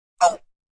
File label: Pain Ooo, Grunt, Impact, 1 child, quick; Voice Box